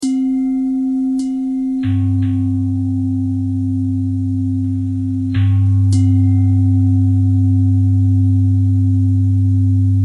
bell thread pentatonic

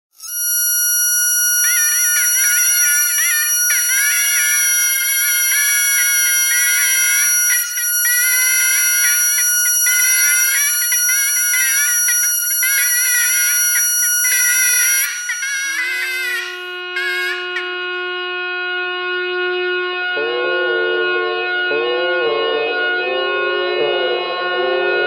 bagpipe radio bagpipe thread noise

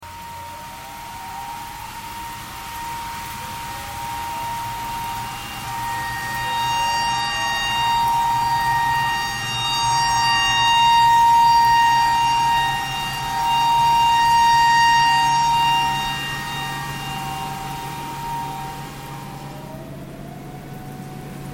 tornado siren tornado siren thread pentatonic

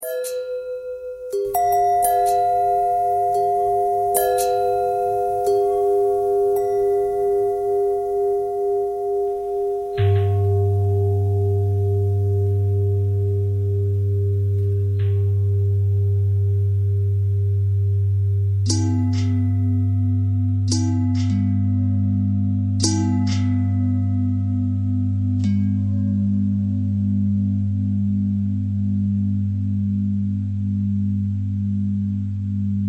bell longbell thread bendthread

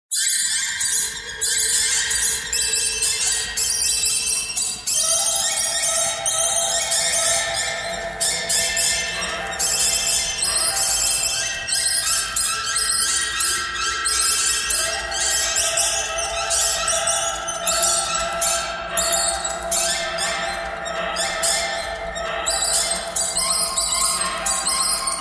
birds thread noise

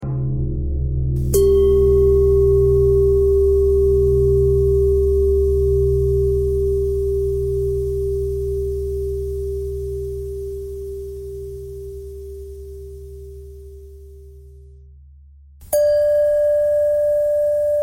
bell thread pentatonic

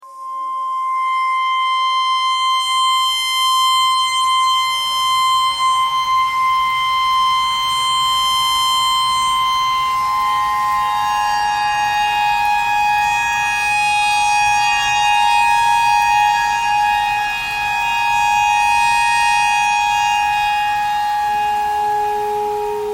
tornado siren clarinet notes e pentatonic

